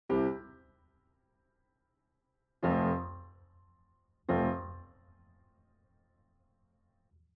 blackgrand test; pianoworks

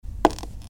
Footsteps Wood Boots walk slow left

